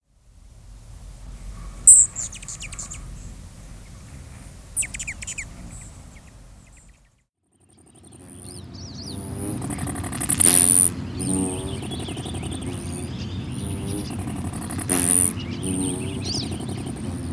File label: Allens Hummingbird